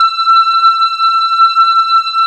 F5 SYNTH, SYNTH ORGAN; AKAI S-Series CD-ROM Sound Library VOL-7

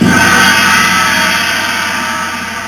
SEQUENTL-TOM; Rubber Chicken Software Sample Bank; DRUMS + PERC

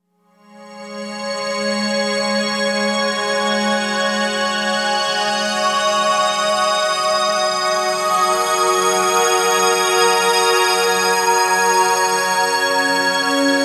Long Hi Complex, Complex Wave; Sonic Foundry; Sony Creative Software; James Johnson Spektral Minimalism

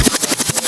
fills

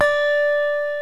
VIBES BELLS

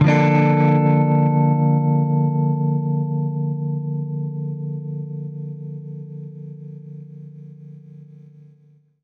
Baritone Chord Dminb6, Guitar Chords

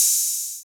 HH, Dance Mix

